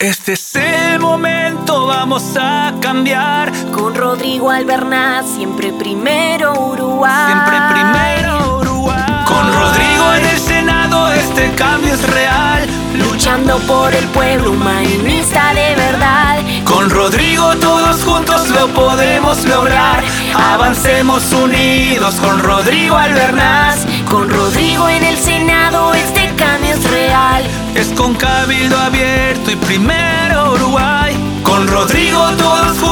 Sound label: jingle